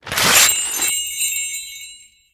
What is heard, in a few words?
cart unwrap; icewater